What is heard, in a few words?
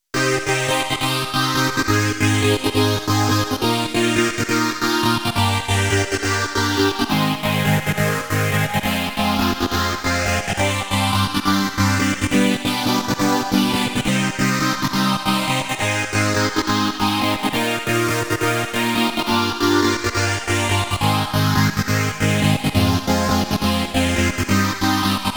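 synth mix, ensemble reverb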